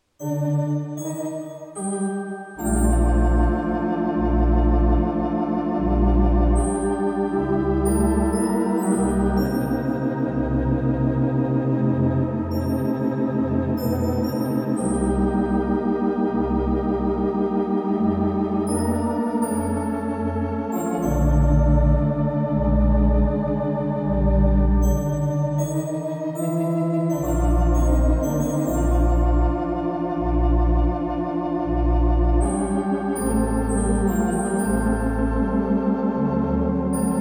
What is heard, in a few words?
wurlitzer, orgue; sanfransisco